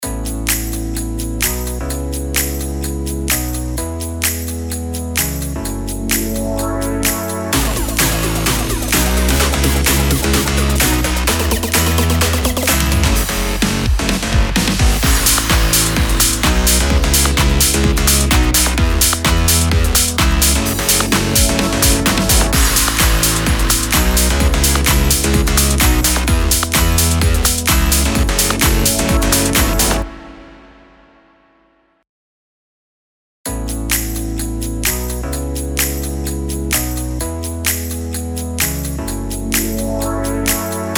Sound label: BED ELECTRO HOUSE EMAJ 128BPM